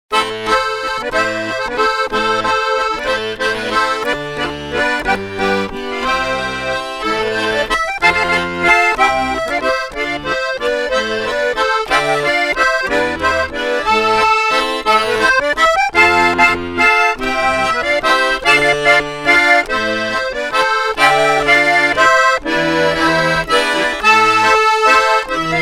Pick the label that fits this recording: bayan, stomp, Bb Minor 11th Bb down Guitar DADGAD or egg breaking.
bayan